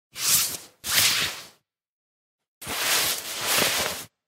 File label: put something in a bag or pocket foley sound effect